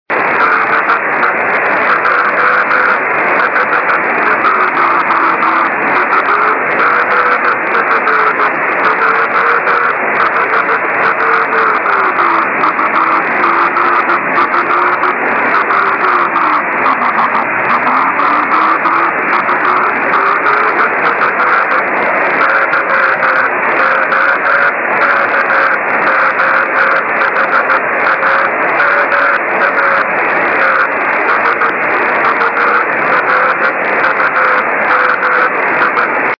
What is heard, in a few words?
24G beacon; くもり、気温10℃ 湿度65％; SSB:RST515 レインスキャッタ ANT: 60cm dish 受信：ＤＤ対応機; JH1UGF 横浜市; JN1AYV 茅ヶ崎市